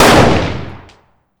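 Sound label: fire dist 556x45 rifle int; gmod; arccw uc commons attachments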